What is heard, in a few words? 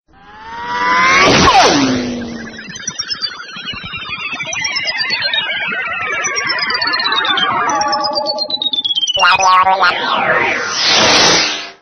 ufo